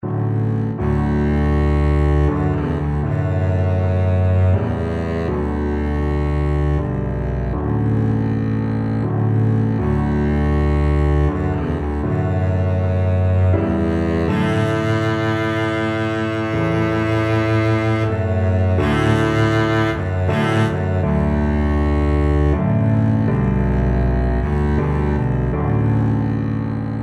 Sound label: amazing grace, Double Bass